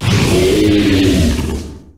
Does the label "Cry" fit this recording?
Yes